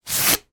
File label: poster remove from tube; foley